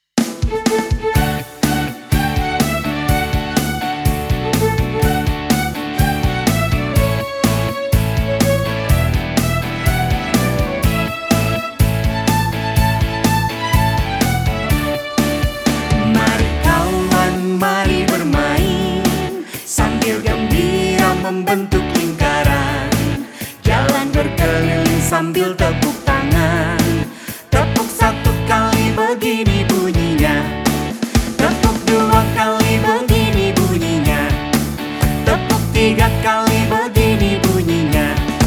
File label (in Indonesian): Tepuk Tangan, lagu anak